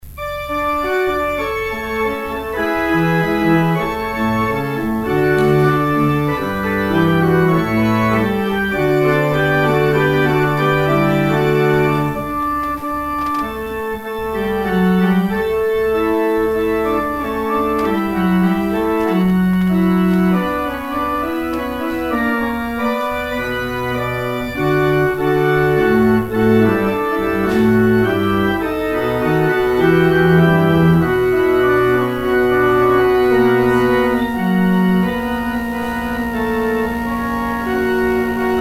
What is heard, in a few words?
organ outro; exit music; St. Mungo's